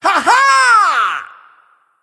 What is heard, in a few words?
battlecry; smite; ahpuch